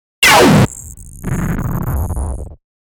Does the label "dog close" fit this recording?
No